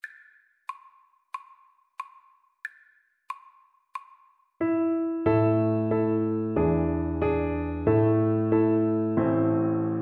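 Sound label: recorder karaoke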